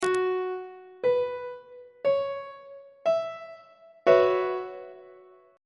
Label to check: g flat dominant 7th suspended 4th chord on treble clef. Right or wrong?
Right